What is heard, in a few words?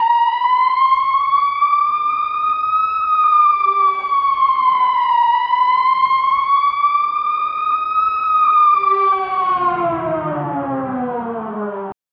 dramatic crime